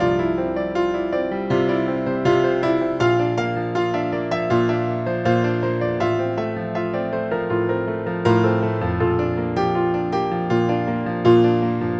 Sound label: Delicate Piano